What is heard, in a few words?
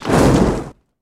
Fire Ignite Wood Sto